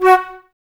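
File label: flute, Woodwinds; Sample CDs; Miroslav Vitous; Woodwind Brass Ensemble; Banks W&B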